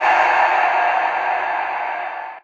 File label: ghost bark; dog eater; trevor henderson